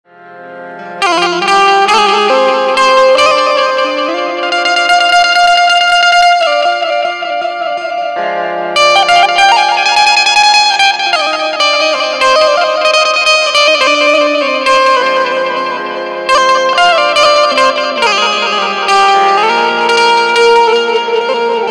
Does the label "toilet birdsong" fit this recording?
No